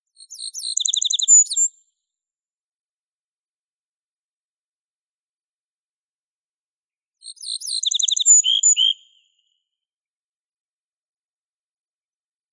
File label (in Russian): ovsyanka kroshka emberiza pusilla